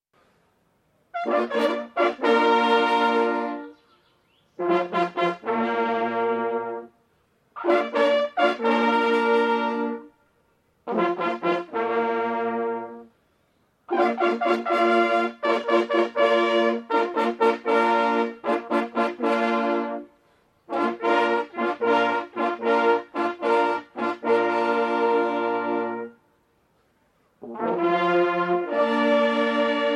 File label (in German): Jagdhornbläser Muffel tot; Holthausen